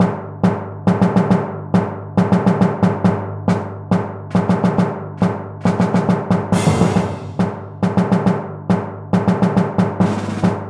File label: Airship Corridor Fast; New Super Mario Bros. U Deluxe